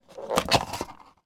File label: plastic lid remove from drink cup; food drink; macdonalds